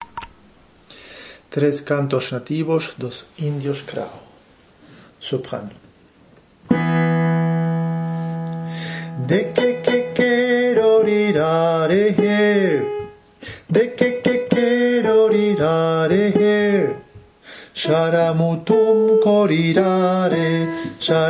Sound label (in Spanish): Canto Indios Krao